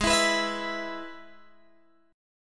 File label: Ab Minor Sharp 5th, Guitar, DADGAD